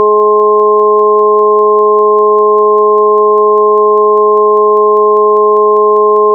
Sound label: alarm; MANTENCIONES LASER; QUANTA SYSTEM; CYBER